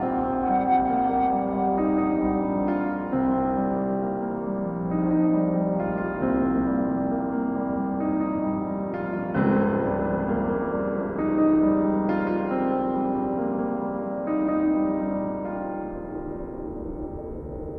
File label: Awkward Meeting loop